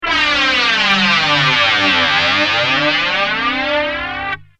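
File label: guitar, AD202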